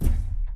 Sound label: footstep jog; Titanfall 2